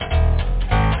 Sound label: melodie, guitarras